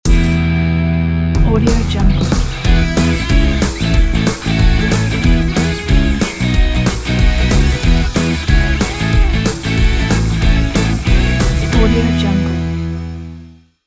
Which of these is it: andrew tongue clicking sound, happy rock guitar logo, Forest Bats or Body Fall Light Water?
happy rock guitar logo